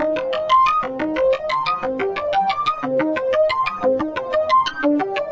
trippy synth arpeggio; SYNTH AND ELECTRONIC LOOPS

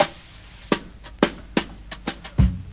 088 bpm brush snare tom

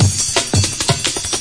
170 bonded, drum loops and breaks; 161 to 180 bpm

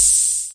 cym, Ace Tone Rhythm Ace FR-1

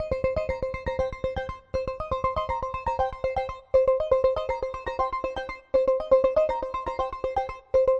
bleep; guitar loops; a bleep odyssey

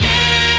scratch me; Punches Hits Discoblasts

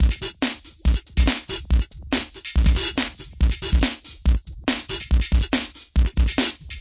crunchy funky rock, drum loops and breaks; 141 to 160 bpm

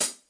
HH ACOUSTIC CLOSED OUTER, Roland TD 7; drum machines

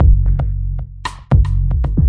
115 bpm, rim kick; drum loops and breaks; chantelles

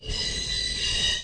subway sound; Subway Sound Construction Kit

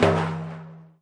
arabic perc, Arabic Percussion Kit; ETHNIC and WORLD PERCUSSION; Arabic Percussion Kit 2

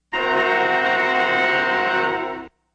suspense hit, Orchestral

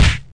right hook; Kung Fu; Kung Fu Construction Kit

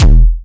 Syn Dr, Overloaded Synthetic Drums; style kits

